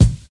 raw kick drum one shot a sharp key, Royality free kickdrum tuned to the A# note